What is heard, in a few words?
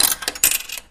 coin insert